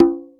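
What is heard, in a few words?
Quinto open, EMU Orbit-3 drum machine; 300 Drum Machines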